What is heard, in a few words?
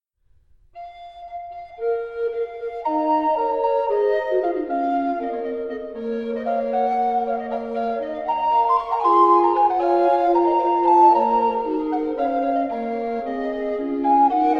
Recorder Quartet; Gesellschaft ist gut; Ludwig Senfl